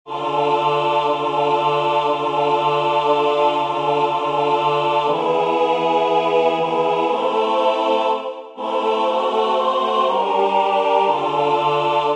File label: Tutti; 7Paroles; Vas ergo